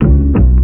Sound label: bass ick